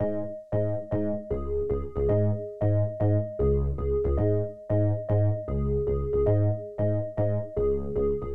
Main Melody Synths D50 JUNO

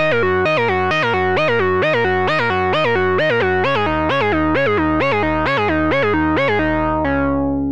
Lead, SH-101; Roland Cloud SH-101

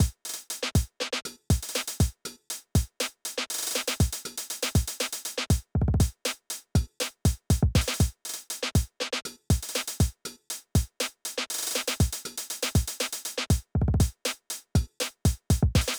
808 loop no 808 bass

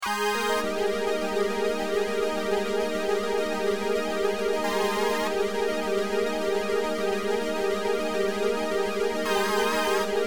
synths

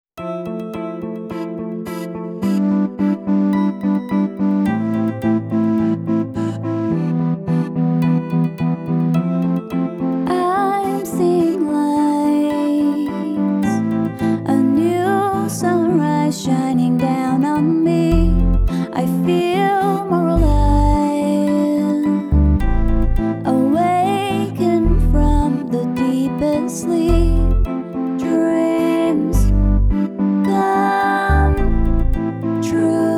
Go Keys 5 Pop Beat

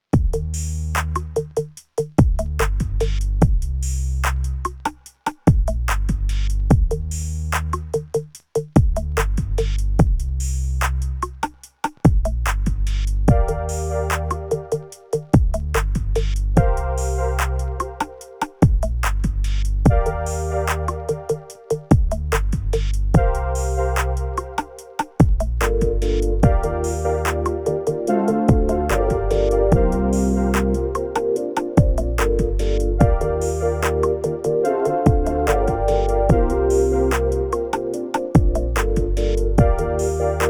Go Keys 5 RnB Beat Instrumental

